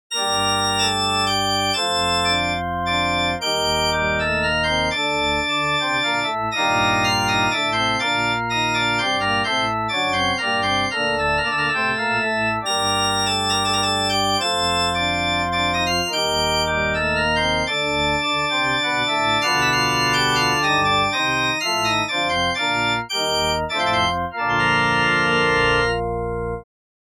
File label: Church Organ F, Minuetto in g minor, dry; Andreas Instrument Sounds AB